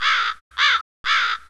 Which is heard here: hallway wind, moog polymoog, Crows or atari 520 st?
Crows